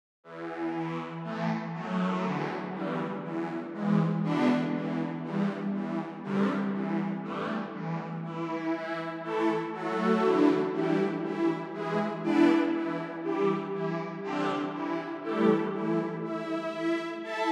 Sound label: Solina; KV331